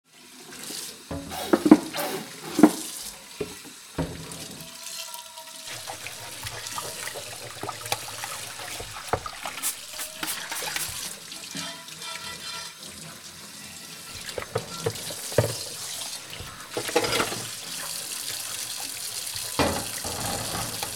Washing dishes in the sink plates clinking water flowing